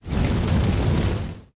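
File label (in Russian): страшный звук полыхающего огромного пламени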